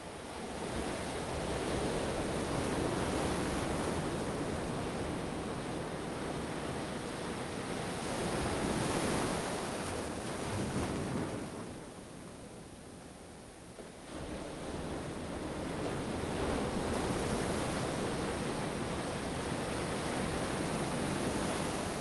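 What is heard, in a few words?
exterior day beach steady ocean waves on rocks water lapping close no people stereo; fvtt sonniss gdc audio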